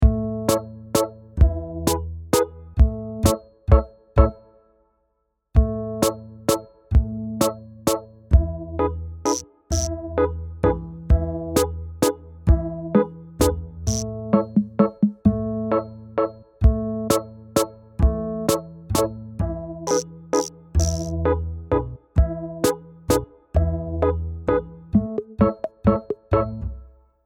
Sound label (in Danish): Mein hut minus vokal; Sangbank